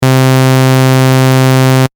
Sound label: adsr